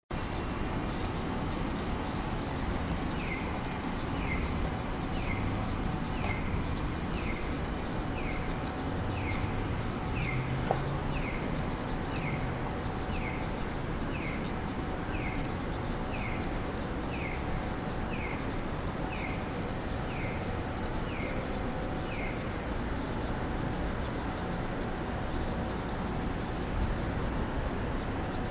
clock signal

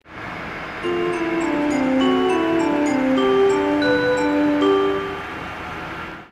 tx2000 chime; tsukubaexpress

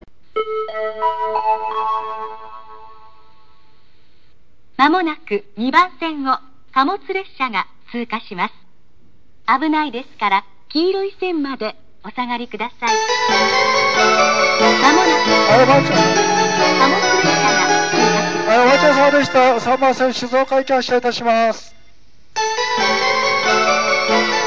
atami, eki; higashinihon

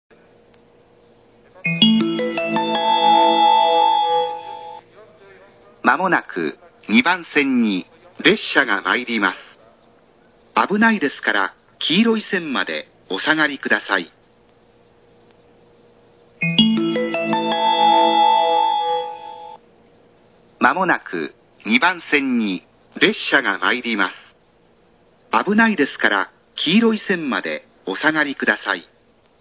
kagohara track train; higashinihon

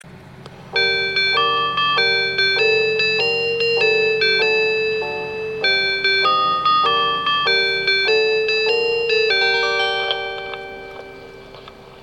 hot7000 chime

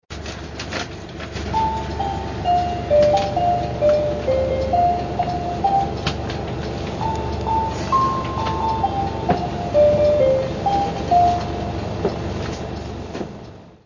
bus chime; jr nishinihon

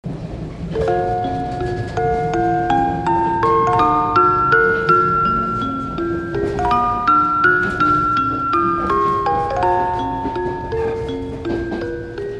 nishitetsu chime

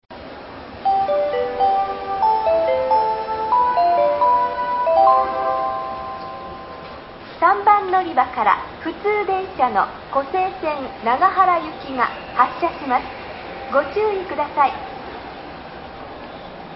kyoto track starting bell, eki; kosei line local nagahara; nishinihon

